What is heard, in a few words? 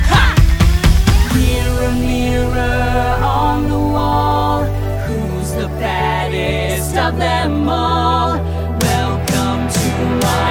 Vocal; descendants